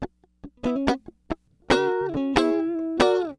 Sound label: 070 Pop Guitar S-Note Rthm C# Min, Electric; Pop Rock; Electric 055-099 bpm; Pro Tools Essential Loops; Digidesign